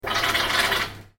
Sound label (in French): Cafe